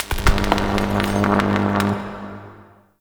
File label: Electricity Spark; Ambience; Warframe; Entrati